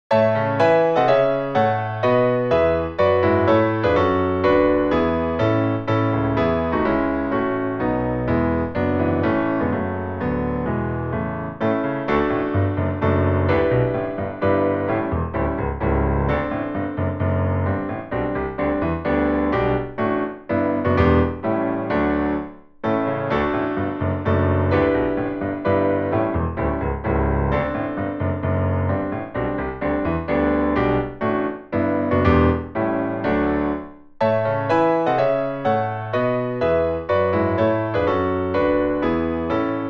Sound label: Montage Small; Schumann Scenes from Childhood